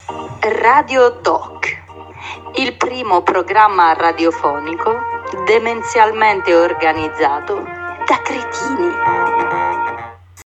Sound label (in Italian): Sigla